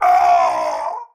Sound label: Death, Battle; Warframe; Tau; Dax; Knight